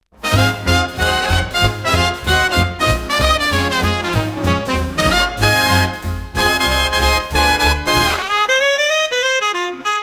boogy 97bpm, Big Band Swing

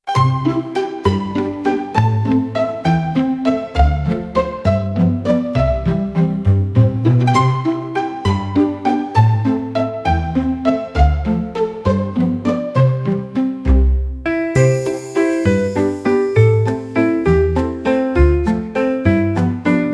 little raindrops, Slapstick Comedy

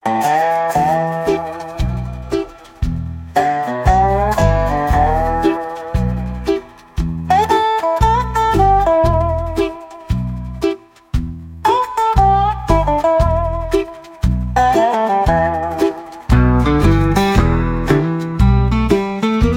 into the mud 116bpm, DarkCountryShades; hartwigmedia

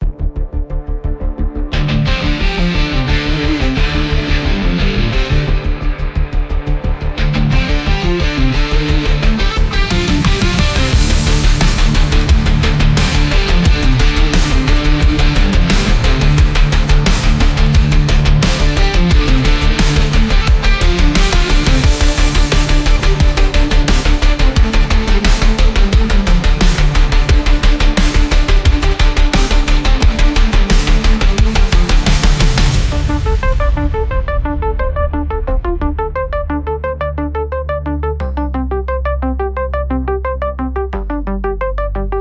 chase 88bpm; hartwigmedia; Cinematix Vol1

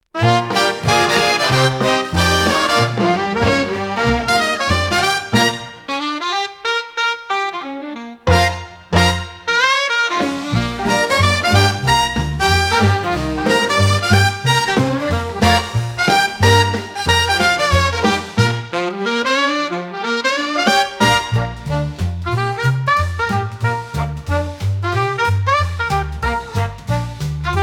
moonlit 92bpm, Big Band Swing

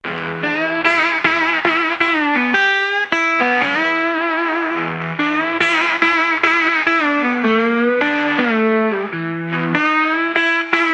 Dark Country, 100bpm; hartwigmedia

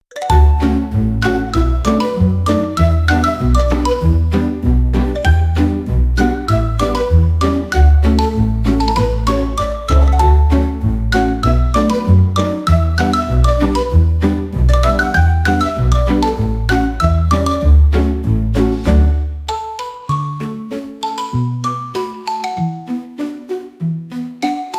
walking happily, Slapstick Comedy

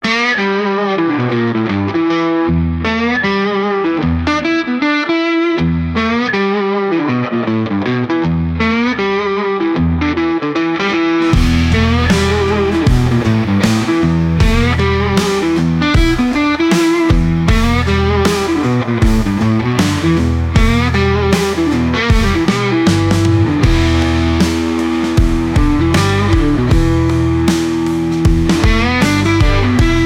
dark shades 156bpm; hartwigmedia

